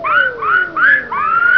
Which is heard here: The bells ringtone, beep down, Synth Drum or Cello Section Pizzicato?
beep down